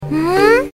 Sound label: combobreak; Chika - 1.2.1